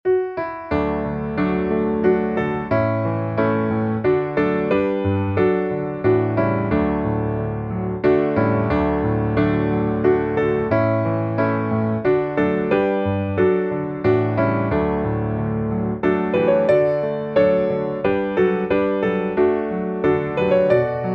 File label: piano